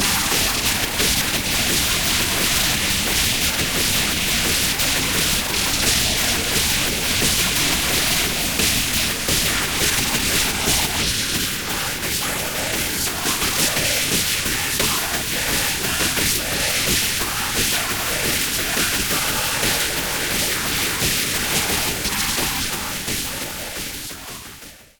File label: pendulum diff